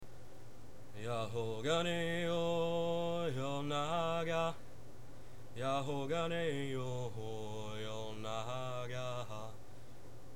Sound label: Chant; Oral Literature Class Performances